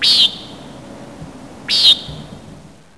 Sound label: Bird; 特酷声音素材库